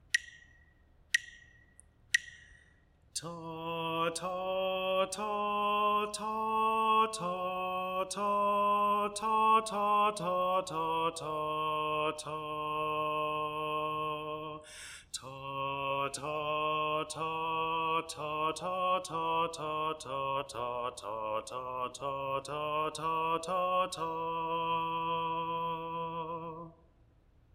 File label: melodic error detection